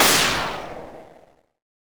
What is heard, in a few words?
gun